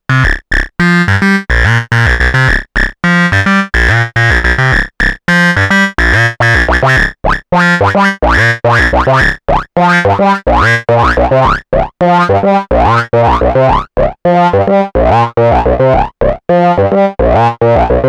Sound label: Korg Volca Bass Cutoff EG Int plus Attack plus Decay